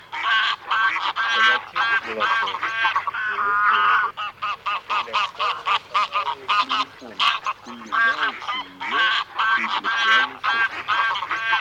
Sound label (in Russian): Гусь серый Oca Selvatica